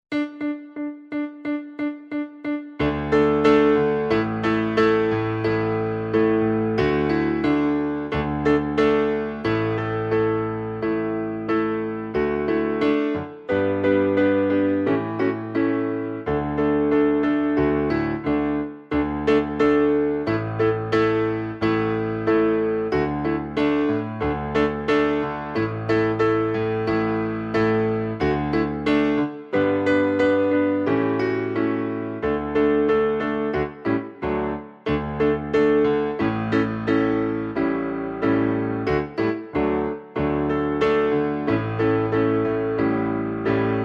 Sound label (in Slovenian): TROBENTAC KLAVIR